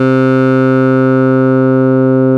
VOCAL WAVE, AX-60; OTHER SYNTHS; Rubber Chicken Software Sample Bank

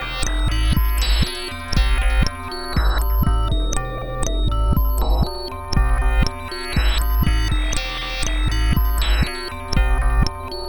Combination Rhythm

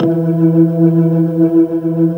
E3, Keyboards Old School; USB Soundscan vol.09; AKAI

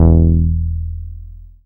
BAS MKS-50; Sound & Vision - Gigapack II CD 1

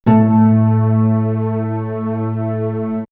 Swirls Space Pluck, pads; X-Static Goldmine

